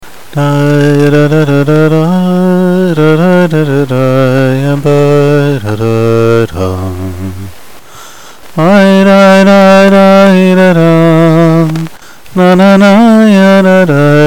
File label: breslov chant